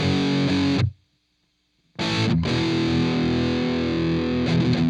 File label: Open Space, Padding, Guitars; ACID MUSIC